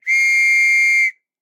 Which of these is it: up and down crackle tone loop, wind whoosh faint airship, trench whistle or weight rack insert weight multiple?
trench whistle